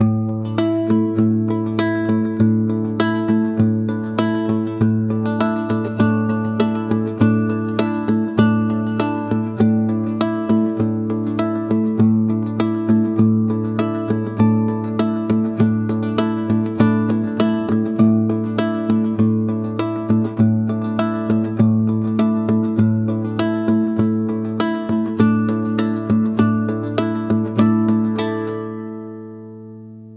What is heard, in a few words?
seamless loop 100